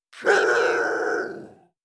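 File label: Huge Dog Rotw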